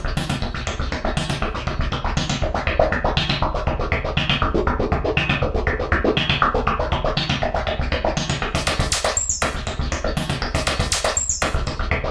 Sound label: HIQ BEATS; Zero G; Malice In Wonderland